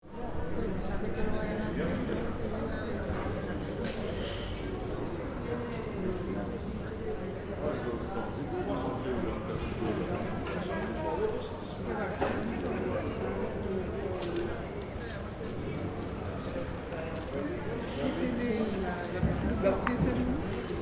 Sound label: Market ambience in a small village Mali Africa